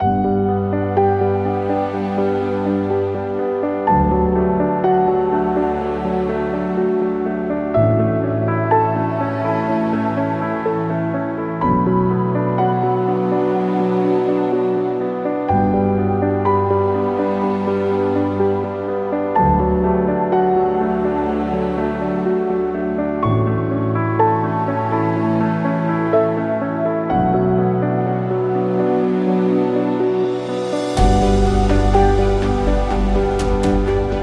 Inspirational

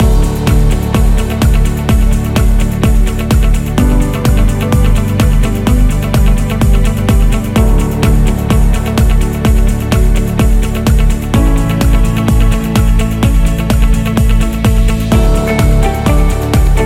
Promo Loop

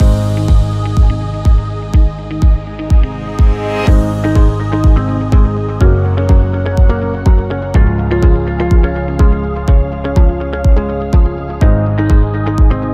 Educational Loop; explainer

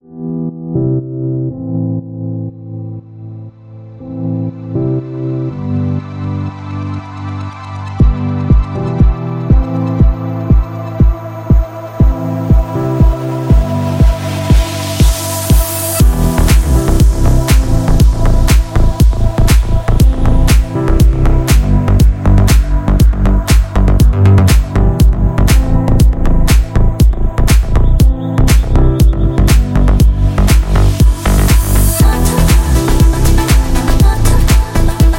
Calm Deep House

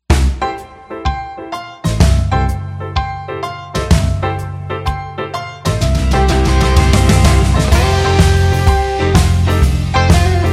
Blues Guitar

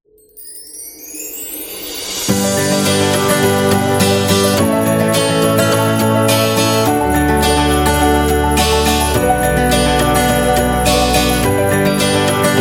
Happy New Year

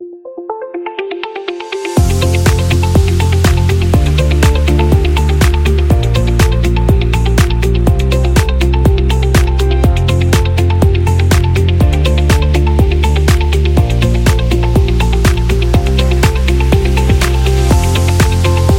Corporate Event

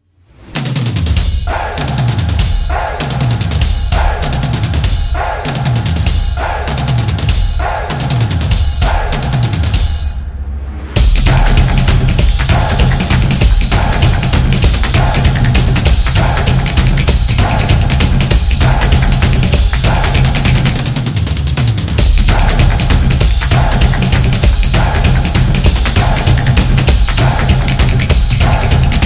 Action Beat; sport drums

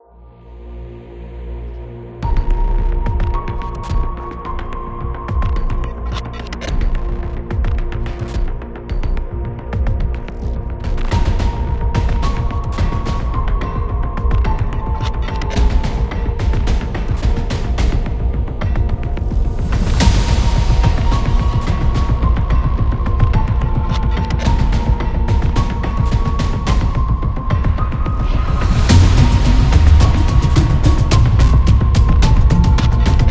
The Suspense

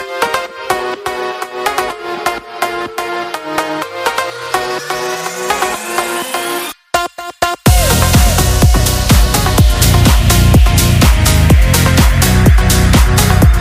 Signal Break, Phonk background music; Phonk background music for drift videos, phonk edit transitions, glitch moments, hype cuts, car POV clips, and neon street aesth

